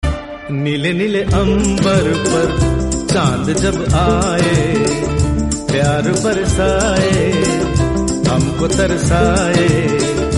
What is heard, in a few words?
hindi old song